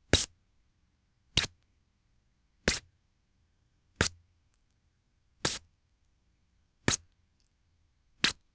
soft paintbrush dipping

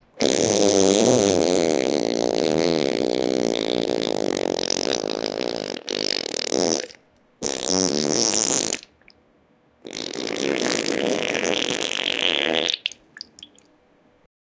the sound of farts and

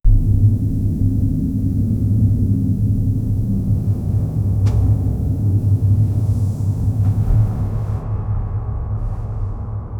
ambient wind soft gamela; world

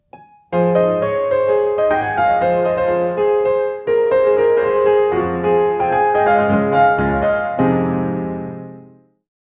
wedding music on a spring

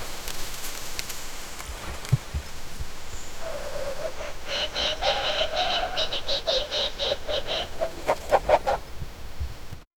rabbit breathing heavily

